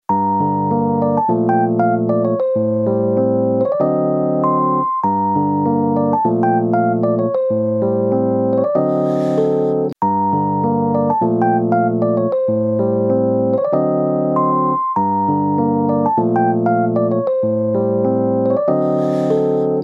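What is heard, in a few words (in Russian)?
мелодия без слов; ost fizruk; melodiya iz seriala fizruk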